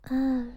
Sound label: hope noises